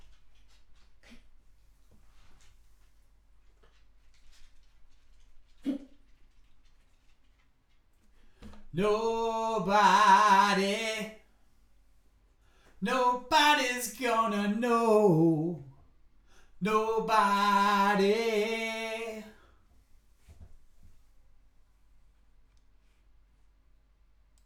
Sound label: vocals